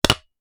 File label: Wooden cup Cheers, alcoholic drink